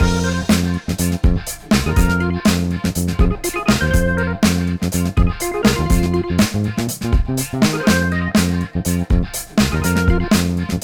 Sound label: Boss Stage Loop; Hamsterdam